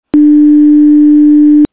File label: sinus